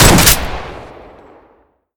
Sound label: pistol fire empty; deluge; weapons v3